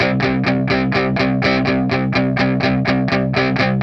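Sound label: 125, Vintage Blues Guitar rhythm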